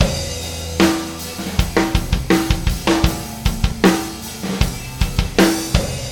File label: loop